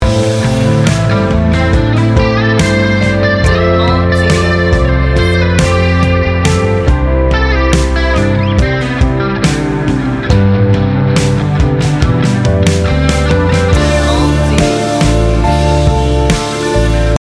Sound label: instrumental ballad; Ada hal-hal yang sulit diucapkan, tapi mudah disampaikan lewat melodi.